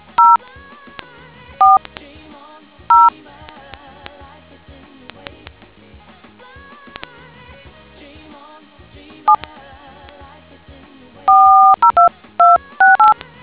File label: dtmf